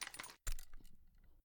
ar idle active move, weapons; gmod; viper

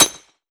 frag physics bounce concrete hard; gmod; viper

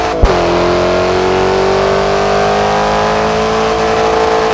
third, vehicles; hellcat

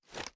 reload empty fast xmag rotate; gmod

